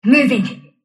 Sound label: sylph move pain; dota; dark willow